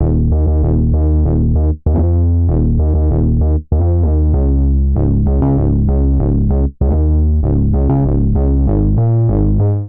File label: Bass Db Major 97 bpm; Bassline; Tonal; MIDI Clips; Maple; Drive and Glow; Ableton; Factory Packs